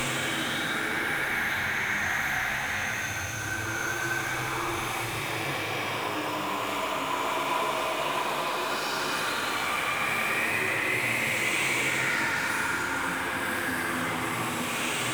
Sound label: Screen Scan Loop; Entrati; Swarm